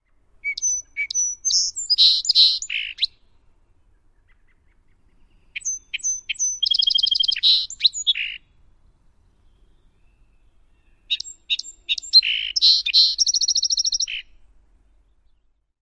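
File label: songs, Song Sparrow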